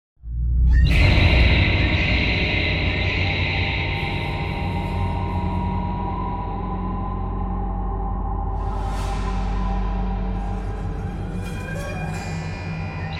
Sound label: The scream; horror